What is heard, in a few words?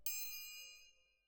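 Percussion, Jazz for piano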